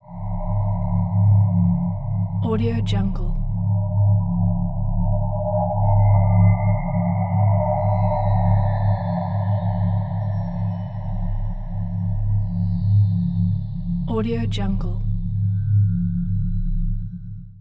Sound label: scary atmosphere